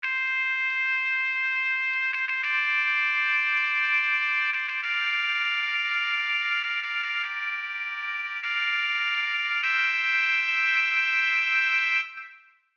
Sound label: trumpets